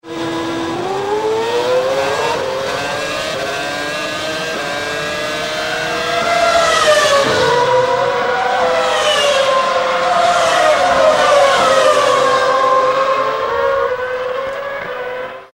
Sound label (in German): Formel1